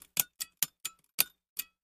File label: Lamp Switch Pulling; switches